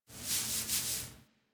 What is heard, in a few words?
Foley Idle Sleep Level Up Shake Light; Enemies; Kubrow; Warframe